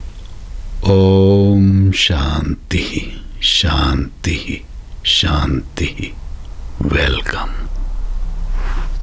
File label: music